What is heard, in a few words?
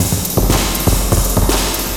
Reverb Beat, Electronic Beats; Sonic Foundry (Sony Creative Software); Myths of Technology